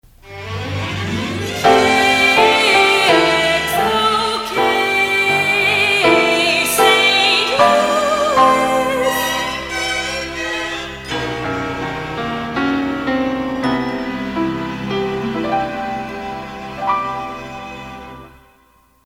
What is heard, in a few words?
Bell Signature, signature played instrumentally following theme; KXOK